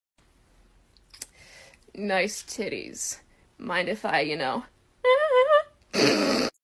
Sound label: honk honk